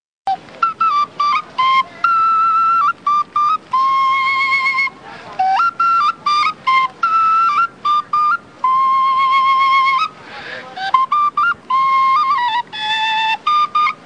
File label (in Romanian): Cant taraganat la ocarina